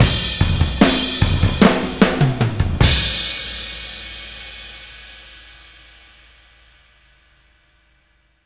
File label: Outro, Full Mix, Drums; Sonic Foundry (Sony Creative Software); Drums from the Big Room The Mixes; Demo Projects